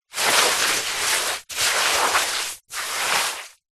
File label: wet sand deep hand dig in; foley; zapsplat